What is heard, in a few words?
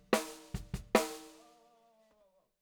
Snare Bot